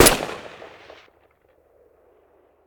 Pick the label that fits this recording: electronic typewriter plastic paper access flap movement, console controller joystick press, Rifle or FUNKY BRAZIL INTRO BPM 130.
Rifle